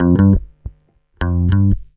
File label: BASS R, Roots Funk; USB Soundscan vol.41 - Roots Funk; AKAI